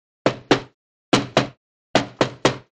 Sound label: Knock On Wood Door; knocks